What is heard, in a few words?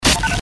Little Phone Breaker; Best Of N2O Library; Breakers & Transitioners